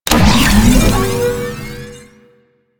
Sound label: Combat Shrine Activation; Warframe; Duviri